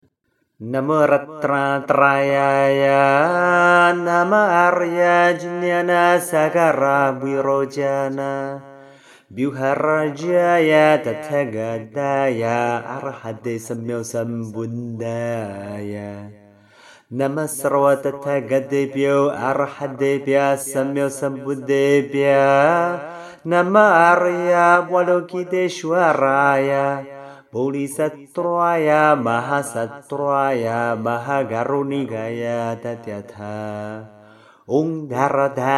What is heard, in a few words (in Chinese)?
十一面觀音咒(梵文唱頌版); 觀世音菩薩系列 Avalokiteśvara Series; 十一面千手千眼觀音 - 大悲咒